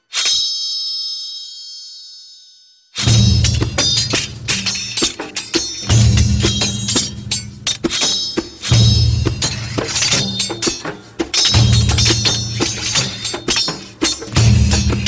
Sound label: Sword dance